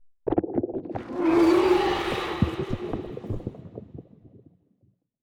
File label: aqudragon reanimated attack; Stellaris